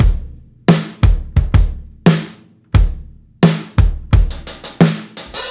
NO HH, breaks, drums; Basement drums vol 2

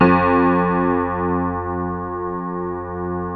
Keyboards Acoustic; USB Soundscan vol.17; AKAI

